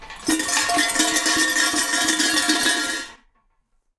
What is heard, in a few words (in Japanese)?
神社の鈴